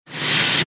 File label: filing